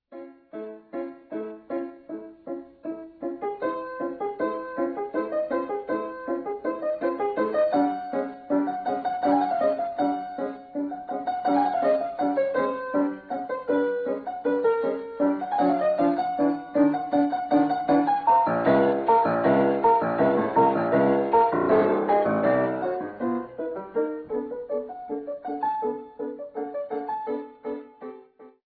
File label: march